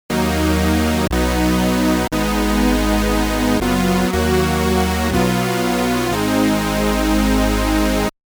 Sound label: Saw Chords